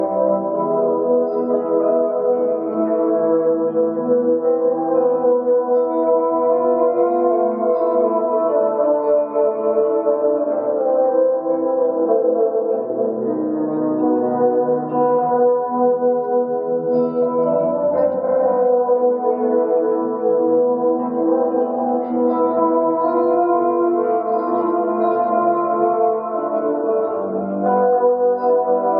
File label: Guitar with violin